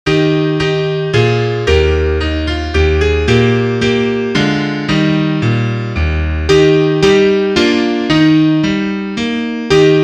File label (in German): Klavier; winter ade